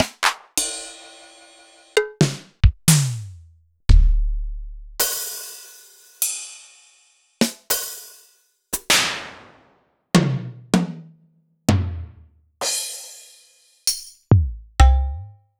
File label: Kawai XD-5